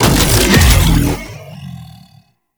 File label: Grendel Deluxe Bowl Start; Warframe